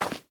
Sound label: Ground short